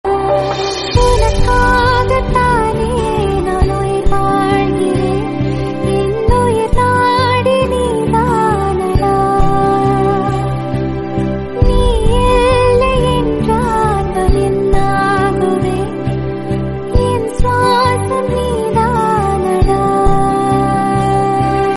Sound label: Song Ringtone